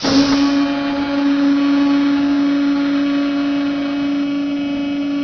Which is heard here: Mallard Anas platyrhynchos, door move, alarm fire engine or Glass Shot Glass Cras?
door move